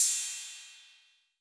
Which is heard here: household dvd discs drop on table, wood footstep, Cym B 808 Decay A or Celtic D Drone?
Cym B 808 Decay A